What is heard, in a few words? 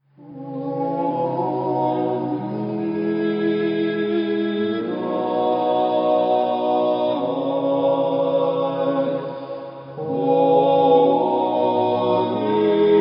Holy God Reverb; Exaltation